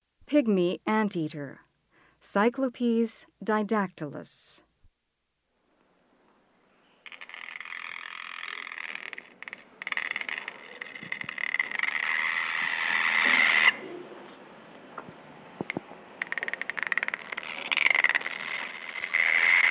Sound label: Pygmy Anteater; S. America